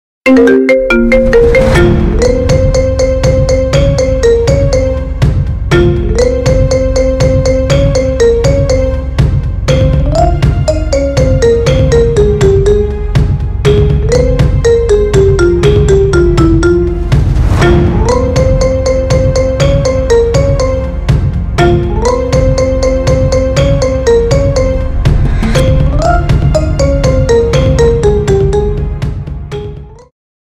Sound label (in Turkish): Marimba Remix, Zil Sesi; Izmir Marsi